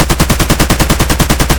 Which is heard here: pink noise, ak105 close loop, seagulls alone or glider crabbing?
ak105 close loop